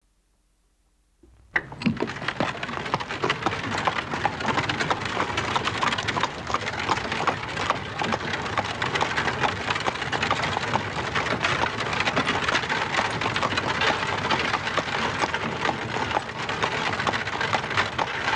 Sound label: Pony and Cart; Electa Quinney